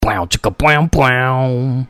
Bow Chicka Bow Bow Sounds Car Horn

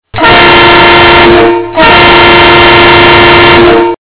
Locomotive Train Sounds Car Horn

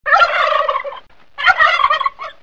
Turkey Gobble Sounds Car Horn

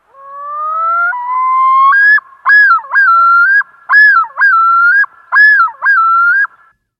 Loon Yodel Sounds Car Horn

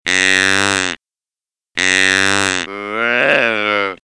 Deer Breeding Bellow Sounds Car Horn

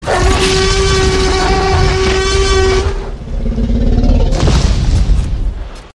T-Rex Roar Sounds Car Horn

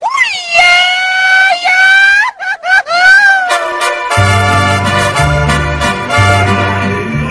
Mariachi Yell Sounds Car Horn

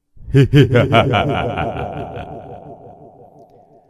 Evil Laughing Man Halloween Sounds Car Horn

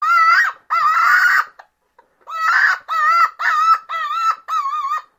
Chicken Distress Sounds Car Horn

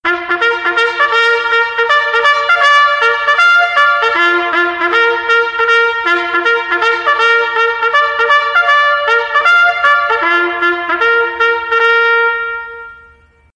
Military Trumpet The Assembly Sounds Car Horn

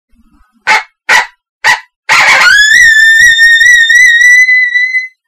Coyote Challenge Howl Sounds Car Horn

